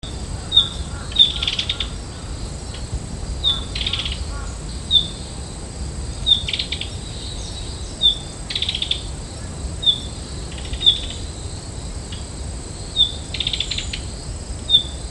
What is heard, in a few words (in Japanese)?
kibitaki